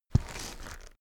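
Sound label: step gritty stone step, footsteps; schritte; menschen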